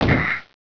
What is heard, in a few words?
pl fall pain, player